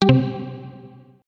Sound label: Short soft message